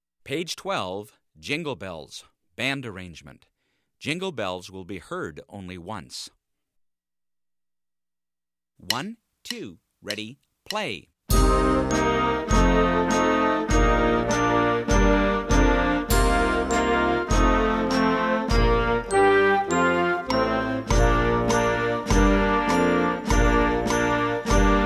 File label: jingle bells